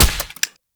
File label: Shot Initial Mech; Warframe; Weapons; Acceltra Prime